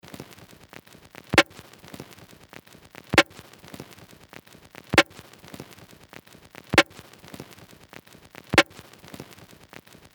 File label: Groove